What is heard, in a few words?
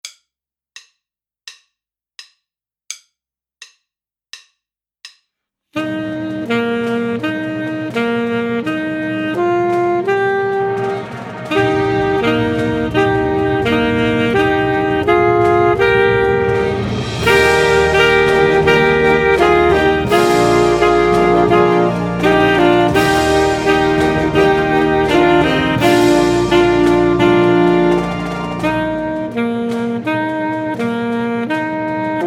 Fanfare Full Sax